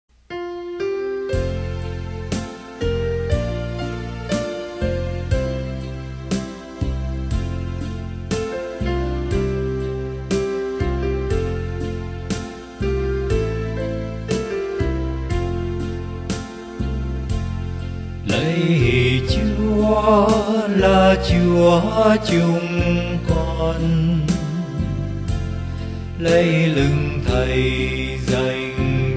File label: BASS